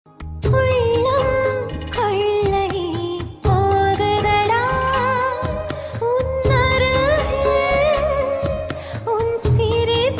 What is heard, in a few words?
serial song